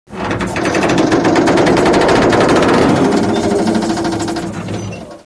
metal break

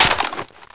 556 ar clip tap

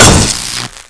tsg hit

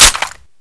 hk416 bolt release

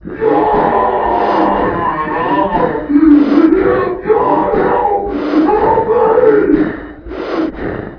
torture

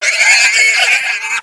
pig die

